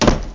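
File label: door close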